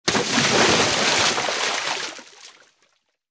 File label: Diving Into Swimming Pool, Interior